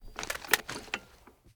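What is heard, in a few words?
ar akilo47 drum reload empty movement; weapons; viper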